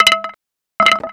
Resynth Wood Hit

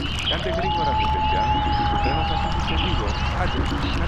ambient mix loud

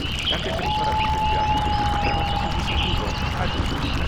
ambient mix

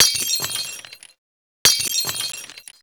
Resynth Glass Break

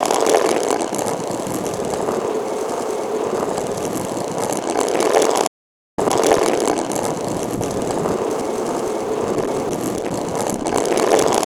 Resynth Stone Roll

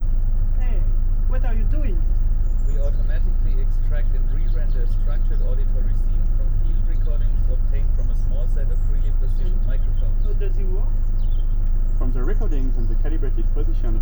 ambi Outside

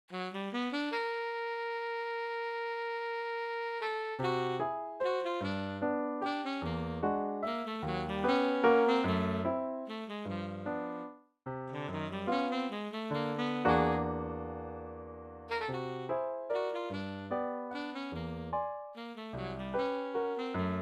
saxophone

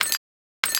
Resynth Glass Hit

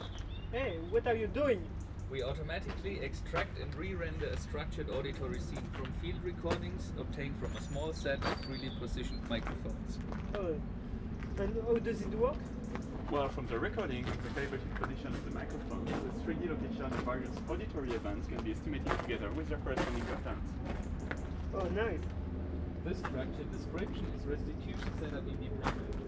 mono Outside

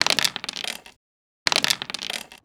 Resynth Wood Roll

